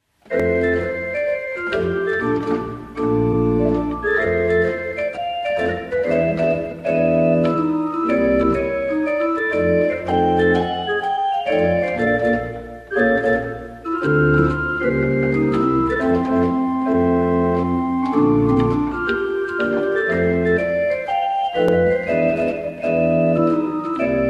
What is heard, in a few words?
Jazz Pop